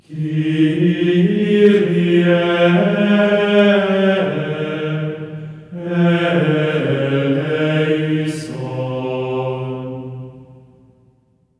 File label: KYRIE F4, voices; Spectrasonics; Symphony of Voices; GR KYRIE